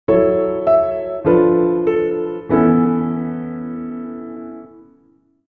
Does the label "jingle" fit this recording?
Yes